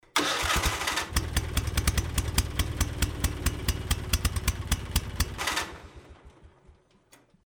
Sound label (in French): son isole voiture